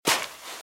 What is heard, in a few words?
Footsteps Sand Dry Walk Left; RadwinIsland